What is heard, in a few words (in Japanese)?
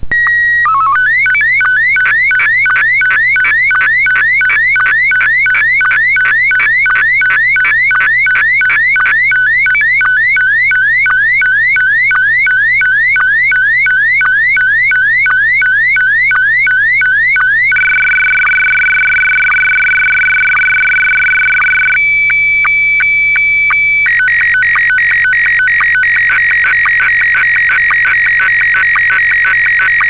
SSTV, DX; ｽｺｯﾁDX 約２６９秒